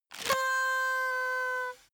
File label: paper hoot soft